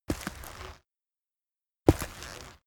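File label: step gritty stone step, footsteps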